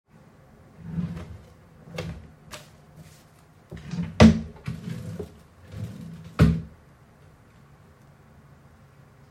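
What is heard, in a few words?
opening and closing desk drawers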